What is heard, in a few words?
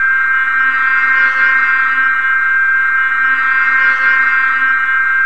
Snow, Sound FX